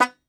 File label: Tenor Banjo, Plucked Strings